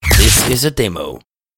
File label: Electric Glitter